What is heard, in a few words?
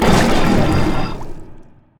projectile; smite; god ganesha skin11